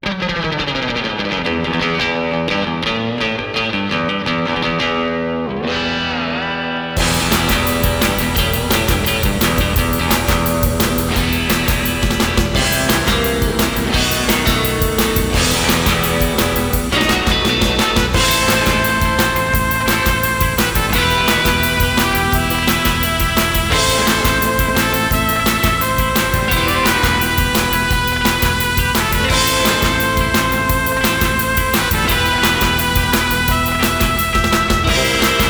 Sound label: Surf